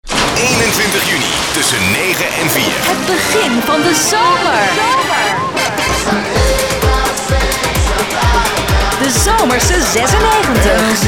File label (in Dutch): 128 bpm zonder stemmen